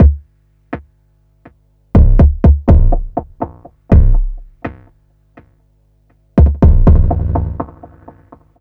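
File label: EAST bass drum, drum loop; Big Fish Audio; Uncivilised G'rooves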